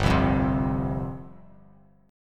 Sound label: G 7th Suspended 4th G down; G7sus4 strummed